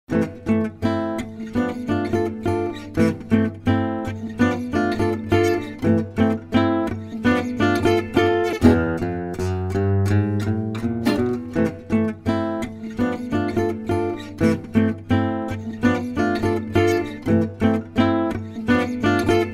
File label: Manouche; MyVirtualBand